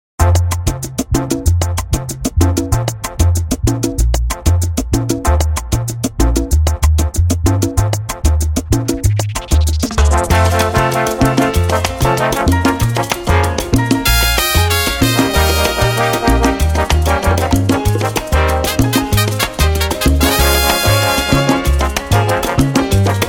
SALSA INTRO BPM 95

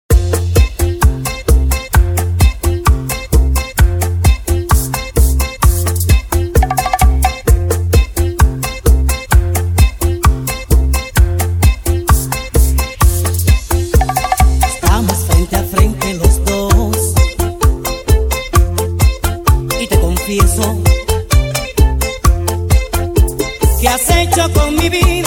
BACHATA INTRO BPM 130

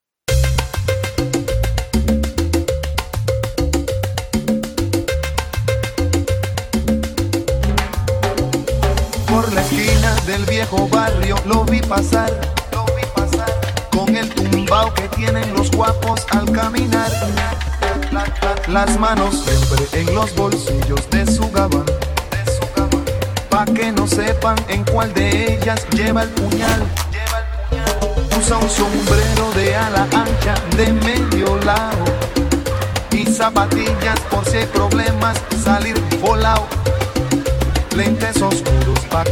SALSA INTRO BPM 100

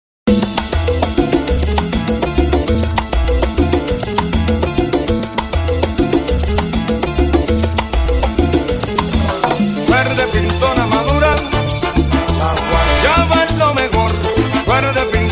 SALSA INTRO BPM 100

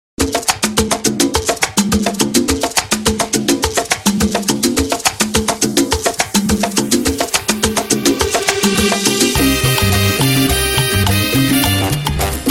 SALSA INTRO BPM 105

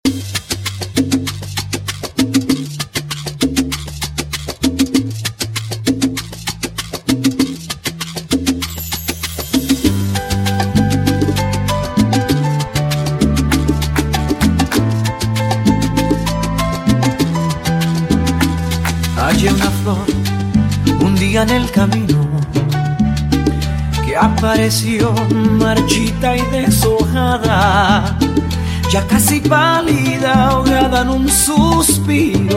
SALSA INTRO BPM 98

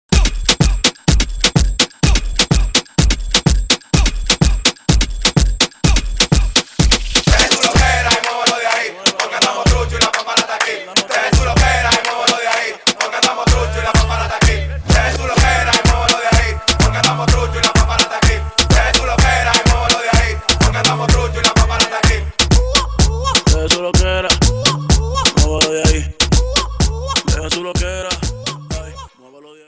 DEMBOW INTRO BPM 126

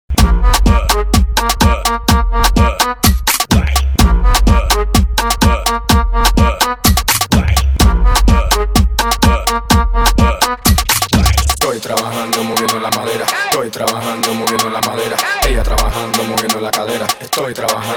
DEMBOW INTRO BPM 126

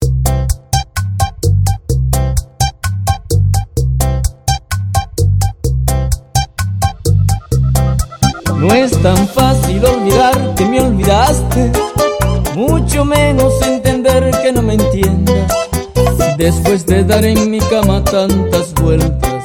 BACHATA INTRO BPM 128

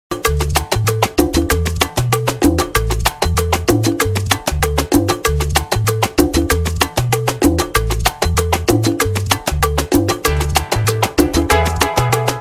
SALSA INTRO BPM 96

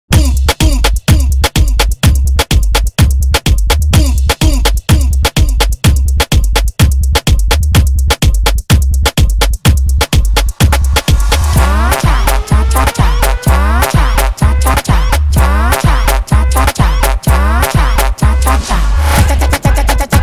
DEMBOW INTRO BPM 126

